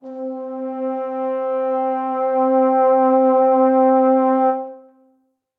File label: vibrato, brass quartet; MPE; Ableton; Factory Packs; Spitfire Audio